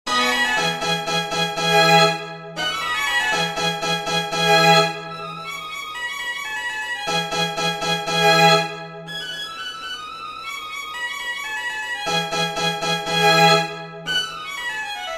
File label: violin concerto, rondo presto